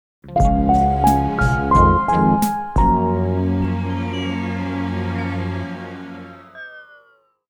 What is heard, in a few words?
tv5 jingle